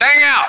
bang out; SpecOps2 MPlayer Demo

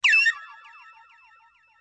Sound ukelele; The Interactive Alphabet

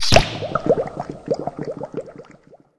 enemy death; diver jimmy

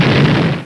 Small Explosion; Cyclone II

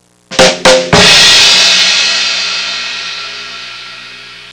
snare drum and crash, percussion instruments; Sound Effects for Multimedia - Volume 1

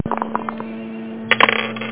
die; Meeting Pearls Vol. II; GTI - Schatztruhe; psion; bangko

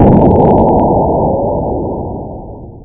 bwoom; diamond thief

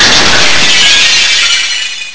BIG SMASH; Carma

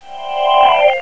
bonus; Ferazel's Wand Demo

